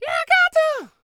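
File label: falsetto, R&B Dance; Spectrasonics Vocal Planet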